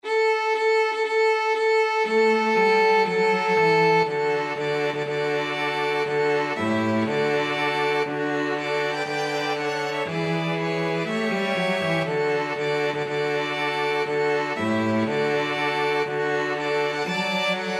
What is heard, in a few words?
string quartet